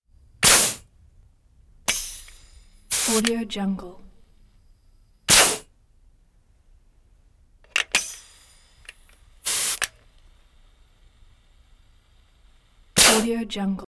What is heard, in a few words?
pneumatic air bursts short chuffs